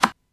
Dealing playing cards

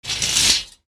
Metal rail curtain rings sliding; blinds

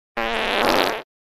Long wet squelchy fart

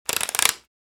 Gear turning ticking

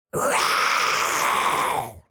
Demon beast imp monster pain damage hurt

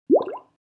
Liquid bubble popping at surface

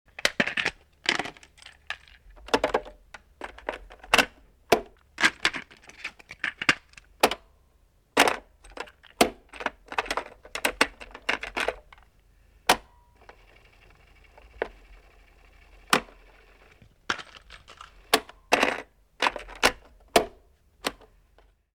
Vintage radio handling antennas buttons

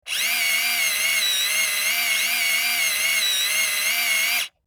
RC truck electric motor whirring; rc toys

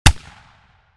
SPAS-12 combat shotgun single shot

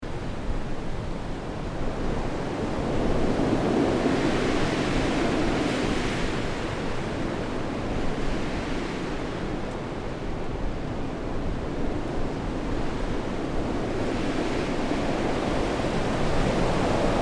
Strong wind gusts wind blowing ambience

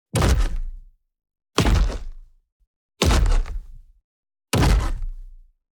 Dragon footsteps heavy walking

